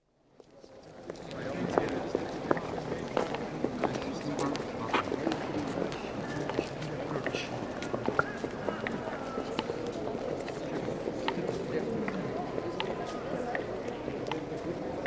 Courtyard, Prague Castle, echoey footsteps, some distinct speech (Czech), occasional bell, distant traffic; Traffic And Car; BBC